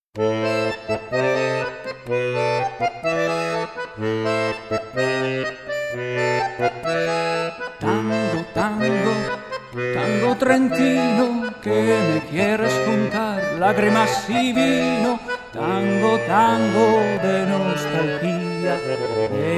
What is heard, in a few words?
tango, voice, accordion